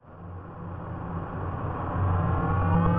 reverse stars falli, transitions and menus navigation; gamer; navigation button fx; nova sound